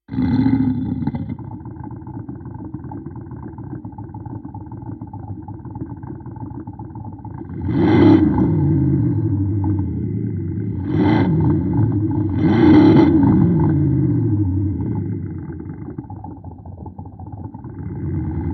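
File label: rattle car, Active Sound System; Thor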